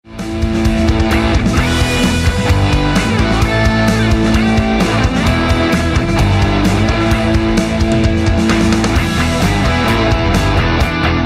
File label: Rock Blussing Music; Rock Blussing Music Pack